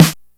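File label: DMX snare, Drums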